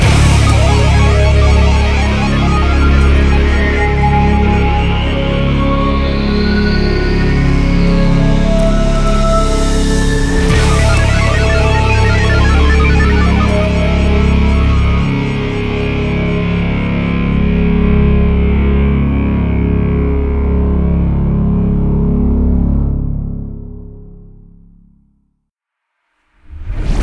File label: Game Over, Music; Jets 'n' Guns